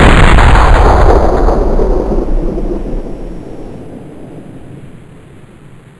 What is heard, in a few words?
aug fire, weapons